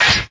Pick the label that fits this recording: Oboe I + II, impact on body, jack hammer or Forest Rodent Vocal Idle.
impact on body